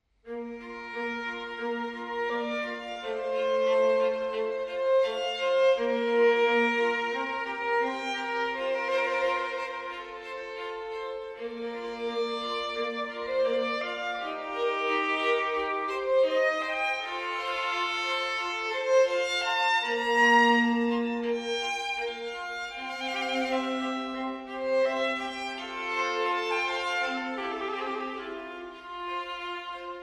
Concerto for violin in B flat major; No 7; Antonio Vivaldi

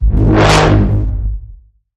Bend The Bassline, G sharp

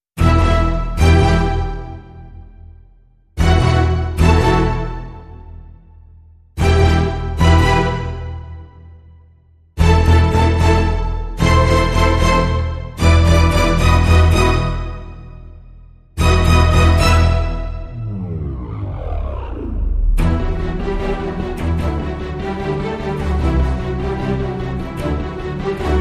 No Perc No Choir

